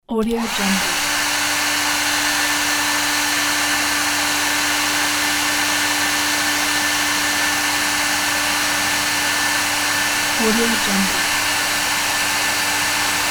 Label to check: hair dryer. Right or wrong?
Right